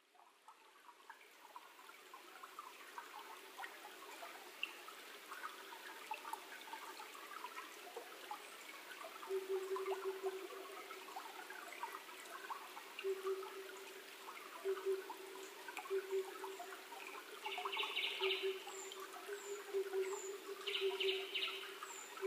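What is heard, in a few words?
tutudori, thrush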